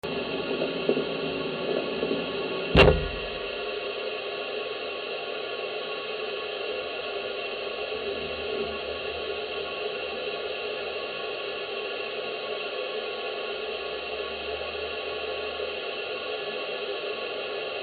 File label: St George terrace copper service